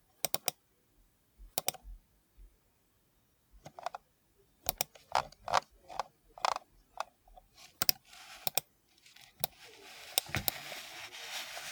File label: computer mouse usage sequence long